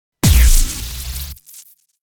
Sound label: EMP Shockwave glitchy electric